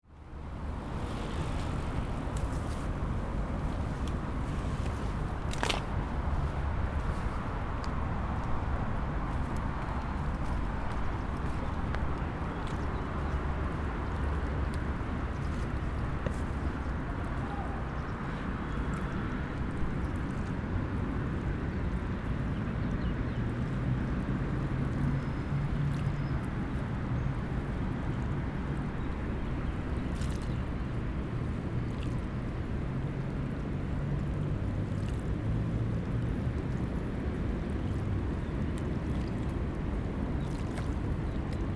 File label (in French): berges